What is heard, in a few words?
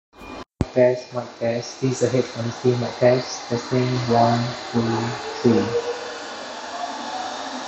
Noisy, headphones